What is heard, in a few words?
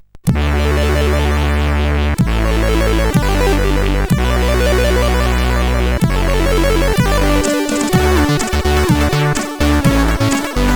sid, CSG8580; CSG8580R5